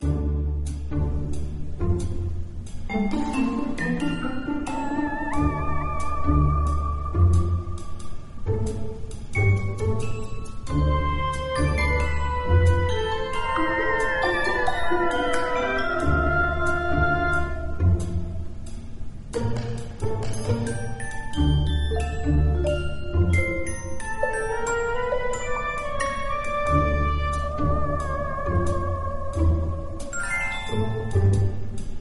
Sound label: music pause menu; Bunnicula - Juicy Bites